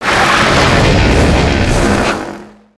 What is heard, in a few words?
Moto Rush; Valiant Daredevil